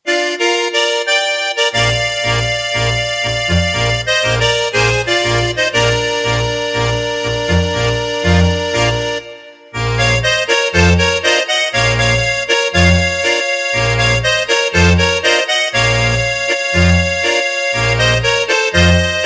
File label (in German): Zwei Gitarren